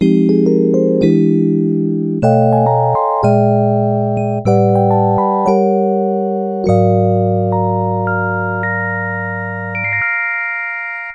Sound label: ep light, yamaha tx81Z